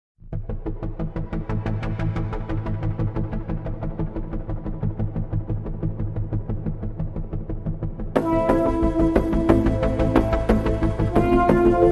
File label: curio music; Risk of Rain music pack; in-game music